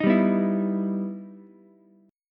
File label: E Minor Major 7th, Ukulele; strummed; 8,7,7,7; JGuitar